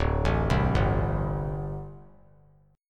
D 7th Suspended 4th, down; arpeggiated; JGuitar

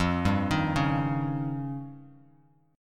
F 7th Suspended 2nd Sharp 5th F Bass Standard; arpeggiated; chord; F7sus2#5; 13,10,13,6; JGuitar

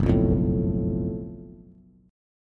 Gb Minor Major 7th Double Flat 5th Gb Bass; strummed; 2,2,3,2

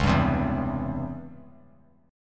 C sharp Minor Major 7th Flat 5th; strummed; 3C,3F,3A,3D; 13,14,15,14

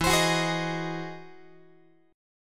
E Augmented Major 9th, Guitar; strummed; E+M9; JGuitar

